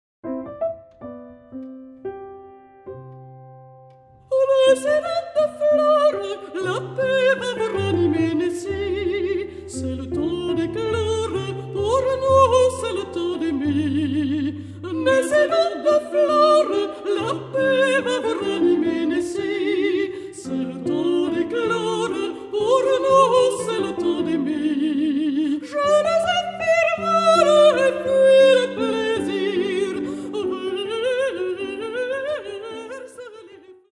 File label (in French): Menuet chante